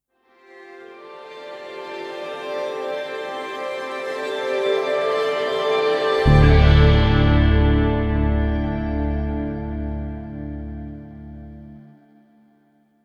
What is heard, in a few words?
Stinger; Killer House